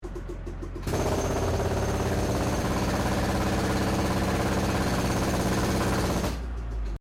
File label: Construction Portable Jackhammer Operation; SoundEffects Foundation2